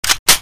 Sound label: draw, weapons